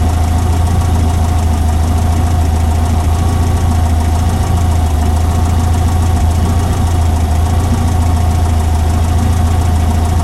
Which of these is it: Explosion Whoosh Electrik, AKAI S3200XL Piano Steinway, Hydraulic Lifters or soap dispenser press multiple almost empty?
Hydraulic Lifters